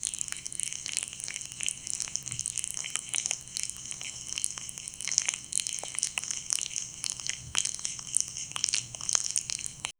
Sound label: flipping pancakes